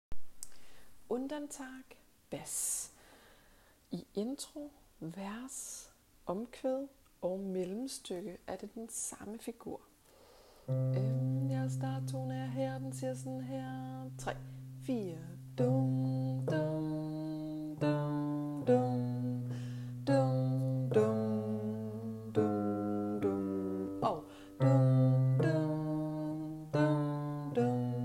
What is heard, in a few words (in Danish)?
bas